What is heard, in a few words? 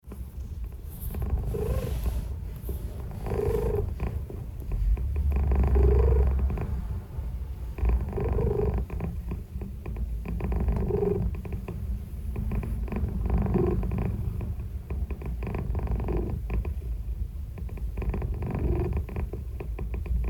purring